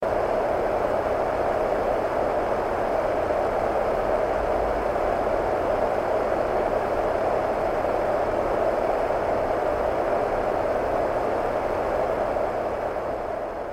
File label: whish Dohm